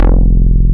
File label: Octave Saws, wavetables; Animoog